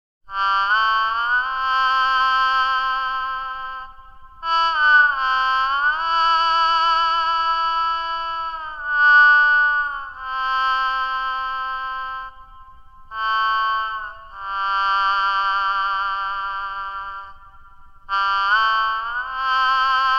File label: amazing valves